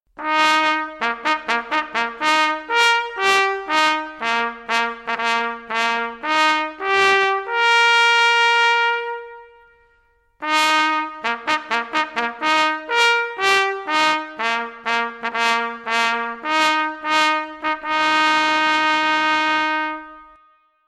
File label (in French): Sonnerie Extinction Des Feux